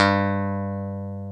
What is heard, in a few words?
G-1 pick, pick bass; AKAI S-Series CD-ROM Sound Library VOL-7